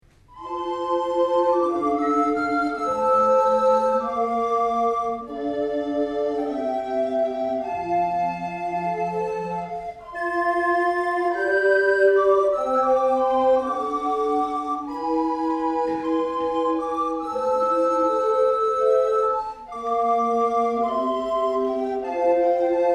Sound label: chorale